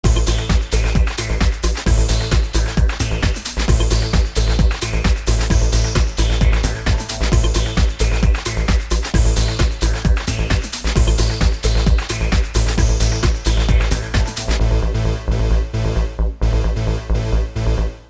lead poppy; xtremelead

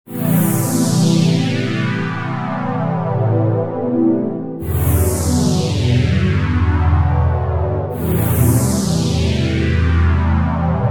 pads; microq

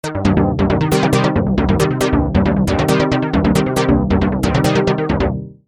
House Bass; microq

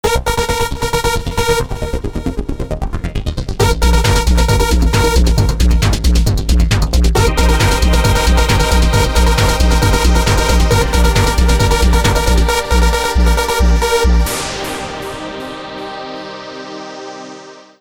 Dance Sound Set; dance&techno set

